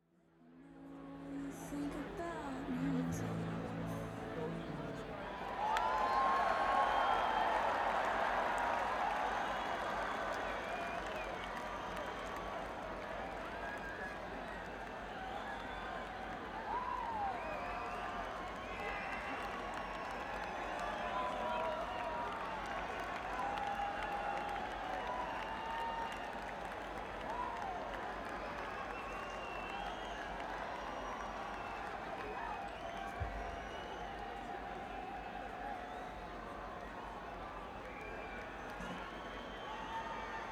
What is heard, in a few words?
Crowd; Essex Junction, VT; Champlain Valley Exposition